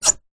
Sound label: household door hatch wooden plastic latch open squeak; zapsplat